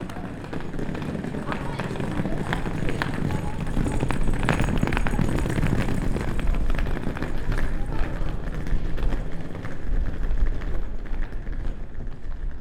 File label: Luggage on cobbles